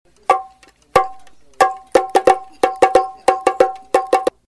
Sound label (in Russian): bongo melodiya ritmichnaya prostaya